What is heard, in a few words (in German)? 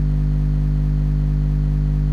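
mikrowelle loop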